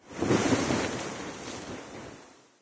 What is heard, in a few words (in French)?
vague